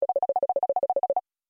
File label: Minimal Tone